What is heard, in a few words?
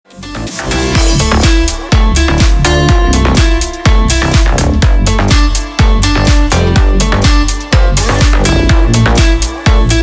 bass club